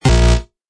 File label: answer wrong